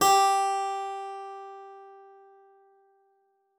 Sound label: SPIN F#4, spinet; Xsample VOL-7